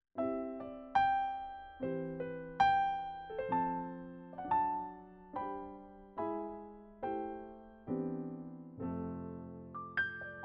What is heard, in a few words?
12 string